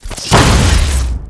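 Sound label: Heavy Tank Rail Gun Normal Attack; blue archive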